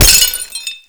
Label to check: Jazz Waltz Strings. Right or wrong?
Wrong